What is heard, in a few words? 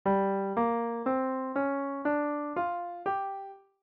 G Blues Scale